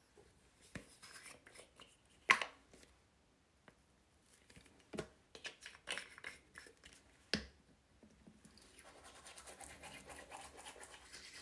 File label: Brush your teeth